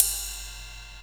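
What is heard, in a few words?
cymbal, dance drums; Best Service ProSamples vol.15; AKAI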